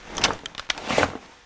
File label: Inf Weapons Foley; Red Orchestra Ostfront 41-45